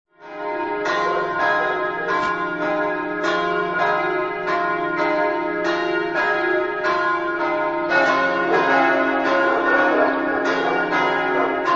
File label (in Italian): campane